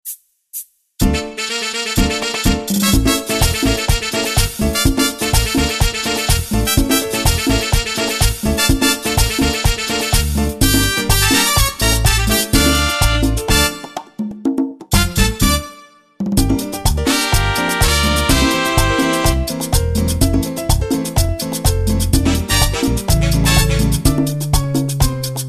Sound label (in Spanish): MERENGUE